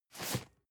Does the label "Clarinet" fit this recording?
No